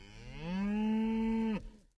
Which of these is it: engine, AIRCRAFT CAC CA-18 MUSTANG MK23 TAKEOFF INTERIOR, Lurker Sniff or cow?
cow